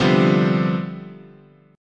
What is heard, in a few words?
C Major 7th Suspended 2nd Suspended 4th